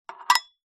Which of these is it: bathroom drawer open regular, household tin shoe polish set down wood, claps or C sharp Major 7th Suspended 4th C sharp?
household tin shoe polish set down wood